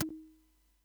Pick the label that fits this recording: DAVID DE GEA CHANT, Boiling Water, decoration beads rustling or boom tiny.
boom tiny